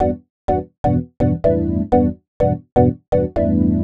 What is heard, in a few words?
Organ chops (125BPM), retro house